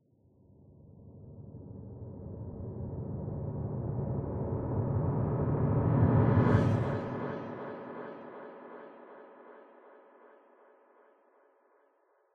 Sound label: Npc Combat Reverse; The Sinking City